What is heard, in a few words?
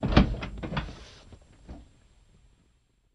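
Cutlass Interior Door Open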